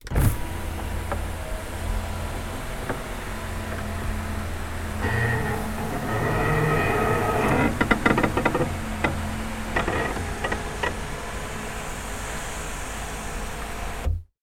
transport car peugeot 307 sun roof cover retract internal